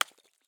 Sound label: ice hit; teardown; ps5 windows xbox series xs